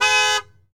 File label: Mustang Horn; Automobiles